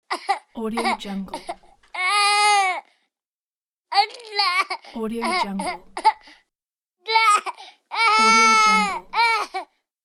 baby is crying

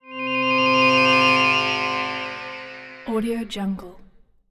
special jittery tech power up; mobile game; esmteam

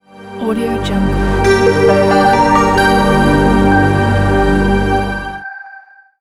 ambient intro logo

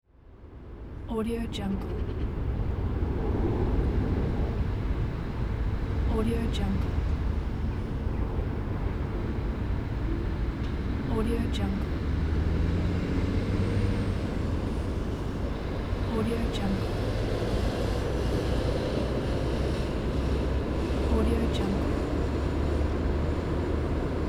city noise

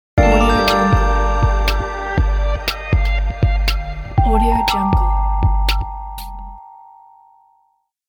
smooth elegant logo

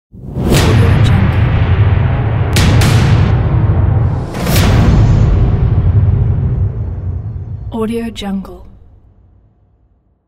suspense knok horror

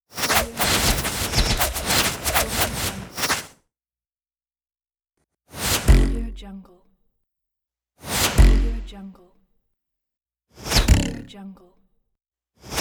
flyby arrow swish whistle twang pack

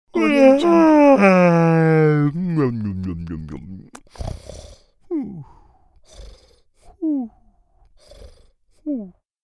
fat male comic falling asleep

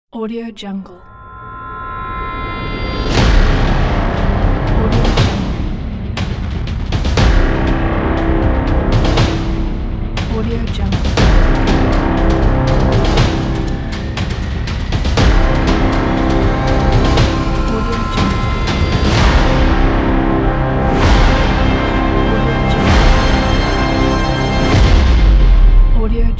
hybrid epic trailer ident; avismusic

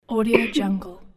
cough female

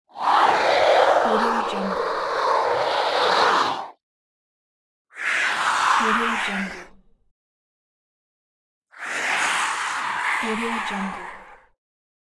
monster pack

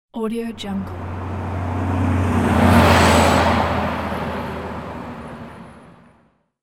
close heavy truck passby

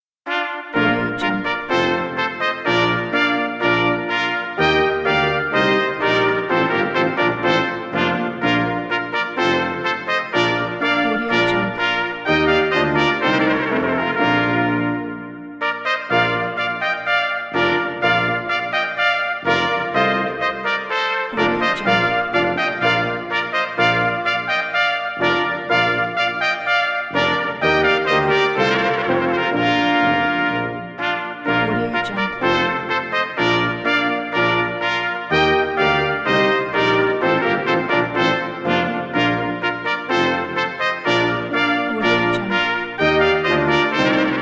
solemn brass fanfare

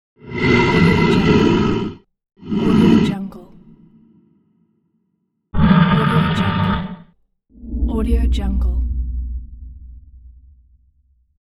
monster sounds; intro boom

